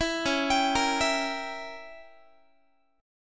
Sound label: C sharp Diminished 7th C sharp down Guitar 4A 4E 5A 5D 5G sharp 6C sharp; C#dim7; arpeggiated